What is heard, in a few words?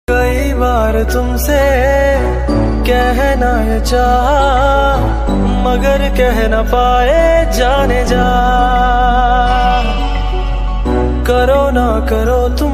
Romantic Hindi Song Ringtone